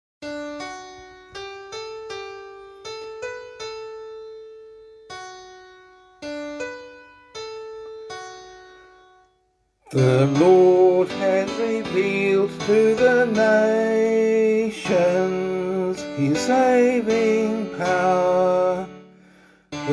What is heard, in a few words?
Psalm, vocal